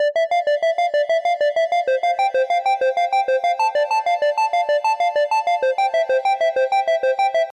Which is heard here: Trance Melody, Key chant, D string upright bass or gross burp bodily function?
Trance Melody